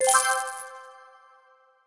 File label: Game Coin Win